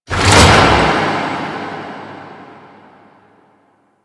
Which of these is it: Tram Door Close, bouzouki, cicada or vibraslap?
Tram Door Close